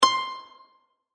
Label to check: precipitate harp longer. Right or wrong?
Right